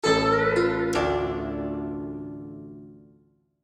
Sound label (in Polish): Dźwięk Przegranej Gry (Game Over); Return of the Heir